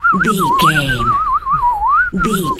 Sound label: bird sparrow tweet